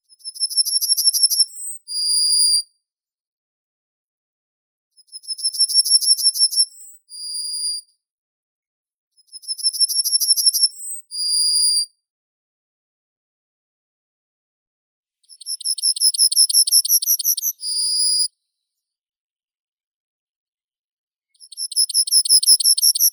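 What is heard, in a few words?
yellowhammer, Emberiza citrinella; ovsyanka obiknovennaya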